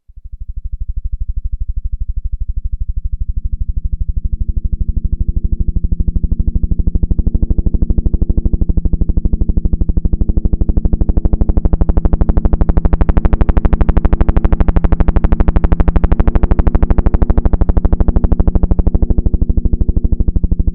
Real Copter; xp-80

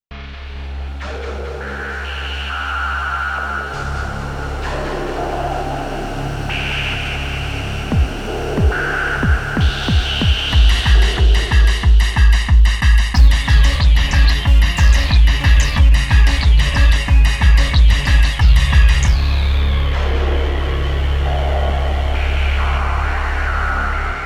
techno; Multi-patch demo 2; xp-80; roland demo cd

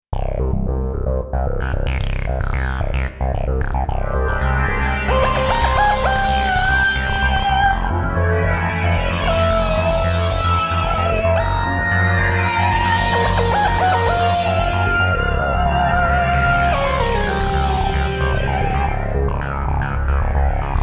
vintage synth; xp-80; roland; Multi-patch demo; roland demo cd-3

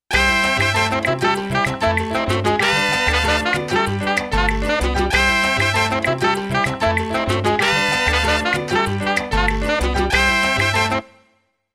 Salsa Lp, 96; patch demo; 16-bit, 44.1 kHz stereo; xp-80; roland; roland demo cd-3

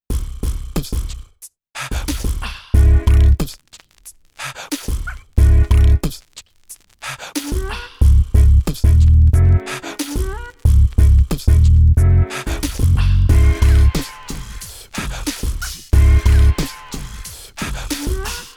hiphop; xp-80; roland; Multi-patch demo; roland demo cd-3

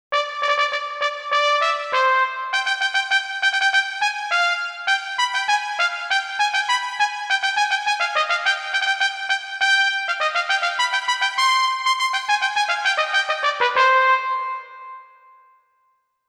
Trumpet; roland; roland demo cd-3

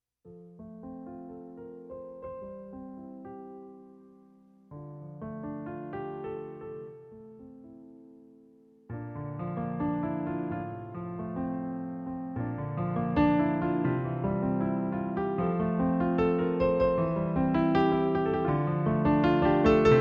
Warm Concert Grand; xp-80

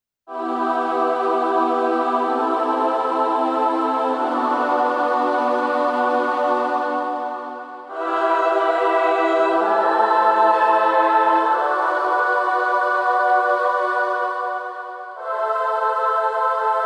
vocal; Multi-patch demo 4; xp-80; roland demo cd

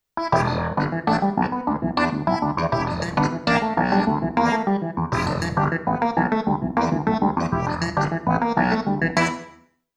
Wah Clav; xp-80; roland; roland demo cd-3

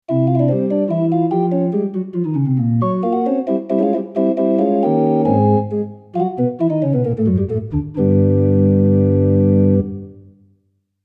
Org Flute; xp-80; roland; roland demo cd-3

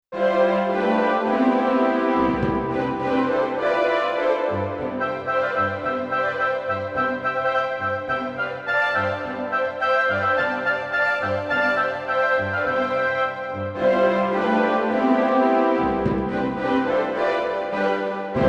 GRAND Orch; xp-80; roland; patch demo; roland demo cd-3

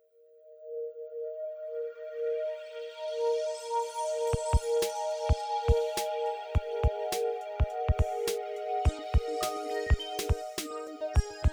Body Noise; xp-80

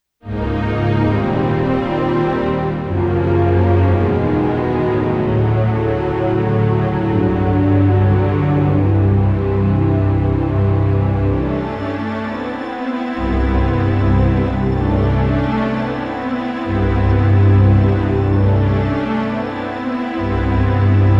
Rich Strings; xp-80; roland; RichStrings2 patch demo; roland demo cd-3

